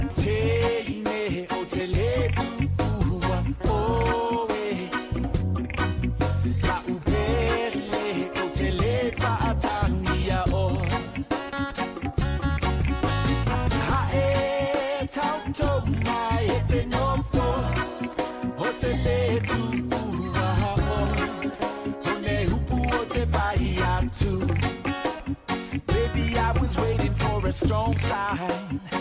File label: samoan natural roots